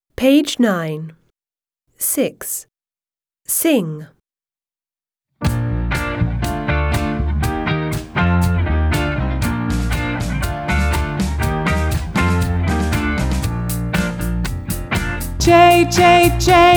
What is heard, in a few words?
alphabet song J to L, British; My alphabet book; Smart Book 1